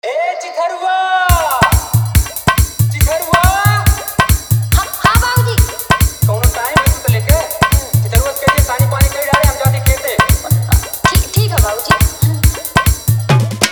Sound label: New Bhojpuri Dj Song Full Dhollki Bass Mix